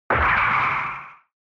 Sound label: Retro Anime Accent High Short